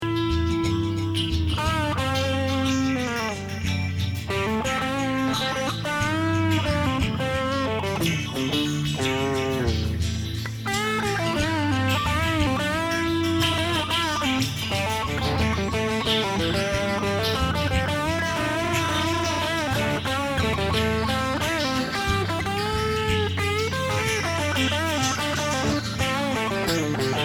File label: feed solo; guitar solo